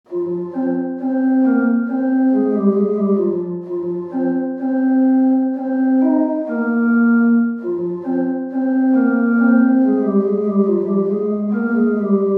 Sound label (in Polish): Fisharmonia; Instrumentownik; aerofony